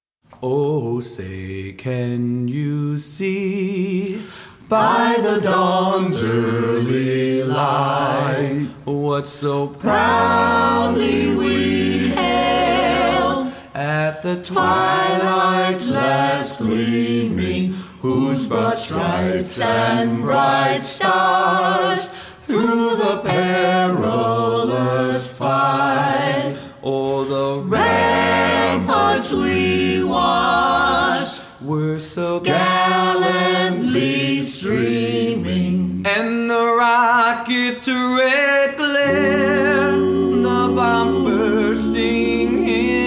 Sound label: Anthem